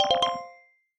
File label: Mail Get